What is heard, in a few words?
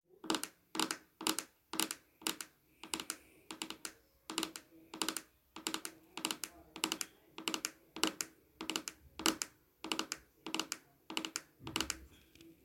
tapping; asmr not just an internet trend